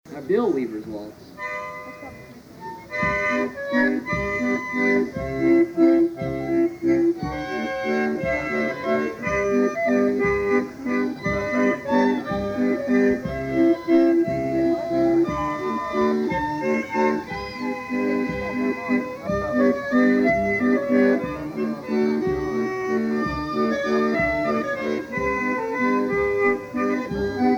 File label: Waltz; Weavers Waltz; greatlakes; battleground